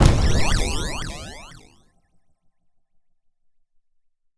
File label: Explosion rev; smite; god cupid skin13